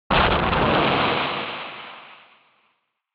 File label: Retro Anime Explosion High Short